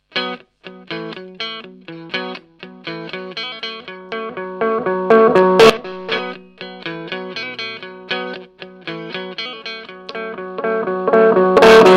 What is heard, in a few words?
Delay Swell